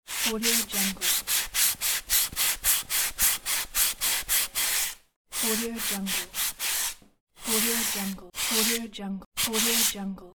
sandpaper

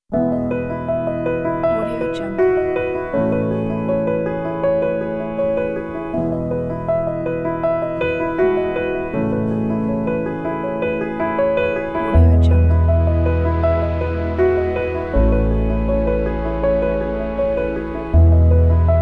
piano inspiring ambient; laps

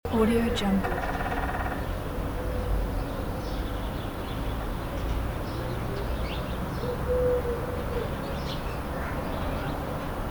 urban spring birds loop

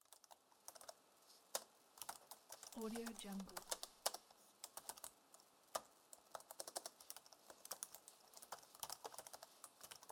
keyboard typing; greenwaysound

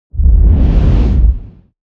cinematic whoosh tremendous wing beat

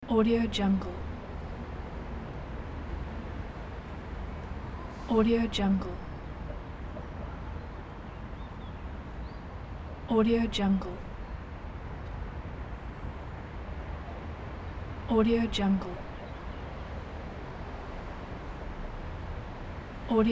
tunnel under wide heavy traffic road; cinematic-sound-design

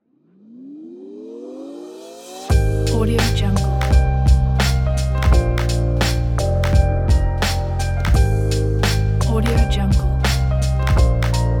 old school hip hop logo; cleanstrings

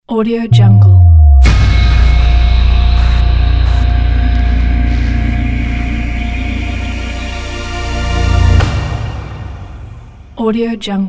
big glitch logo

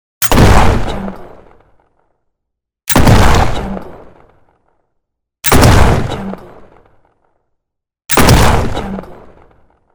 shotgun firing shot

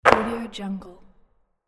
basketball swish through net

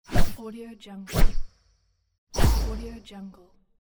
energy magic sword

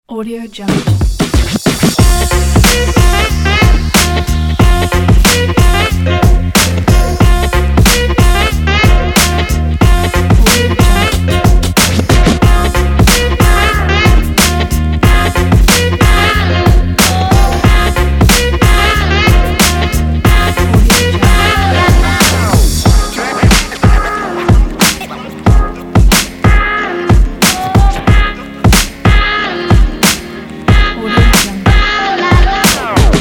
hip hop

